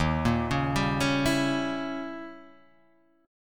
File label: D sharp 7th Flat 9th, Guitar, Half Step Down